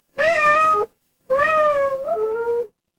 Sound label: animal cat meow